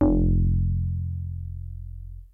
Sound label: PHAT BASS; Kid Nepro; Elite